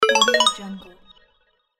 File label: point milestone happy bells